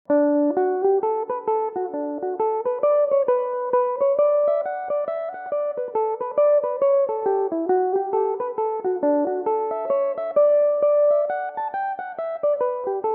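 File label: hornpipe D major belmuse, irish mandolin; rathsallagh hornpipe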